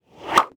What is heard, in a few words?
Note Miss; Levels Hub Swamp; Twitch Skijump